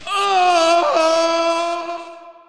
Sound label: fall sound; mdickie